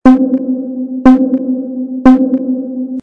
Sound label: countdown; Brazil; crash